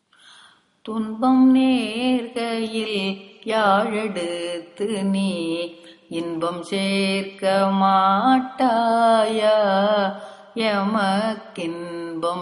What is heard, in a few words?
raga desh cine, carnatic music